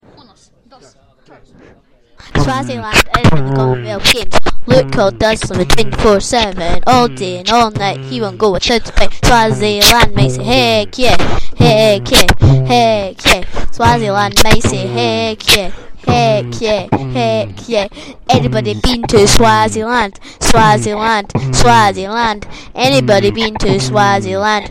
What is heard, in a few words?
swaziland rap